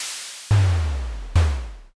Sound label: press